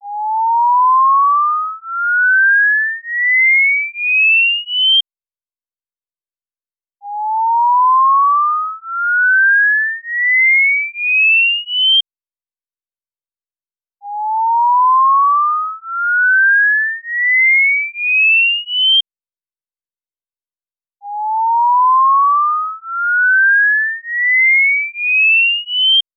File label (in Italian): Prova ritardo elettrico fra canali 12 (Left) e canali 34 (Right); CESI; LaSpezia